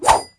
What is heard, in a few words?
sword swing; Minecraft; Remastered